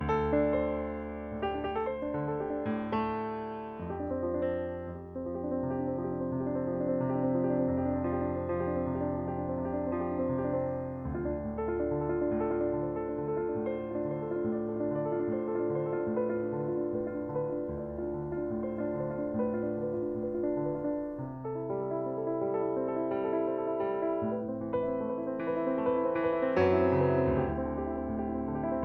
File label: Instrumental One